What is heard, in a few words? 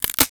BONE BRE, BODYFIGHT; Total Control - Action Cutz